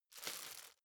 plastic bag rustle slow

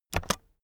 keyboard external space press fast

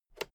fader single slide click

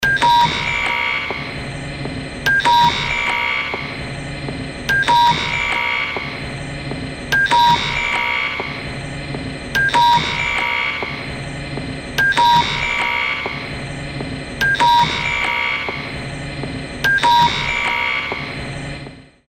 industrial loop

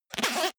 zipper small case open fast

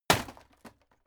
impact sweetener wood shatter medium

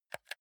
console controller r1 press

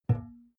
glass door small close soft

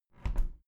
window plastic squeak close soft

